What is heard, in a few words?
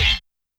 Brass Hit; YAMAHA RM1X